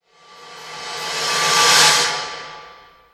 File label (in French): souffle cresc